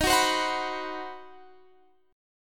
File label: C Minor Major 7th Flat 5th D sharp, chord